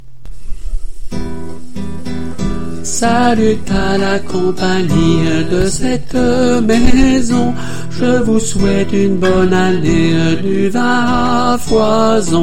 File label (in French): Salut a la Cie TENOR; chorale